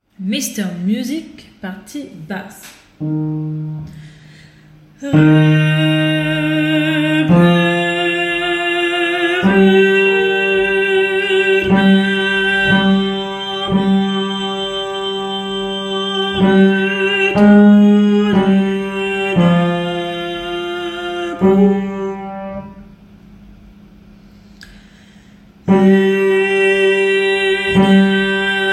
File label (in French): Basse